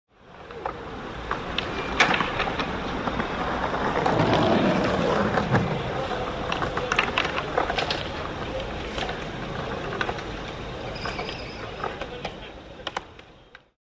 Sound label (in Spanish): plazas skate; lucio fontana